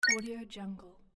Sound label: digital notification